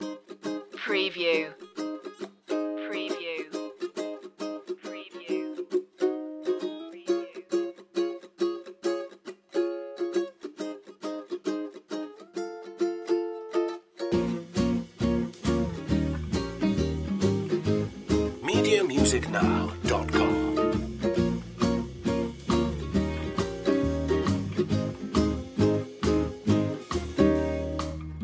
tiki beach loop

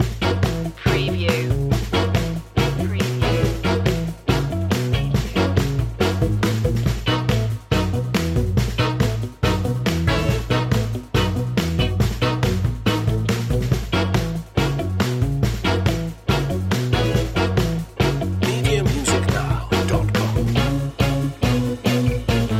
old school detroit loop

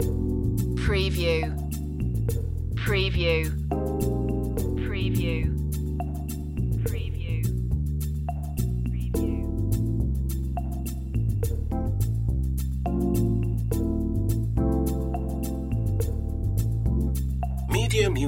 acid jazz loop

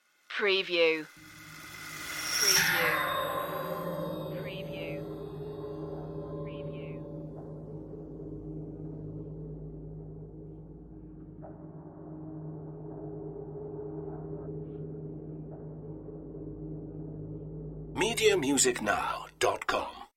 horror steps out underscore

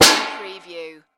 Metal Buckle

